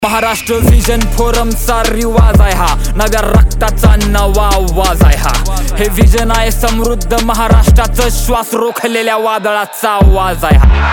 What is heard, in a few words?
Ringtone